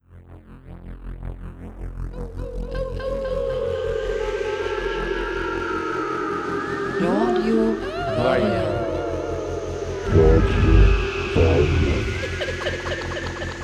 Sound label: Jingle Radio; Vargen